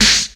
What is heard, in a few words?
00s hip hop snare drum sound f sharp key